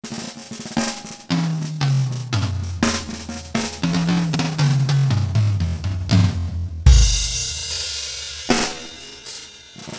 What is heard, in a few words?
Drum Solo; speed 0.56; tsmtoolbox; wsola